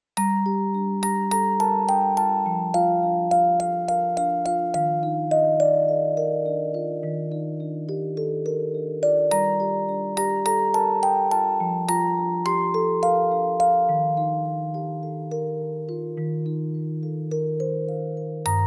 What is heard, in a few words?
Music Box Ver